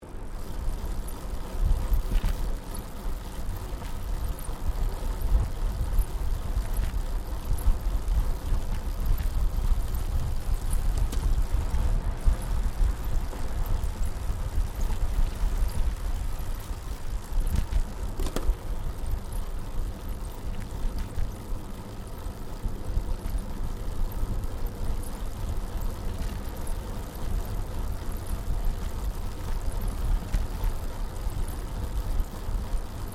Bicycle Riding Smooth Concrete Stereo, 21 speed road bicycle; Giant Crx3; Bicycles